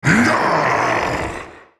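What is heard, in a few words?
ultimate effort, en US; Draven SoulReaper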